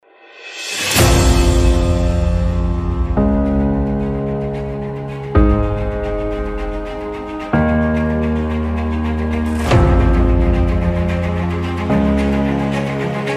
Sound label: saz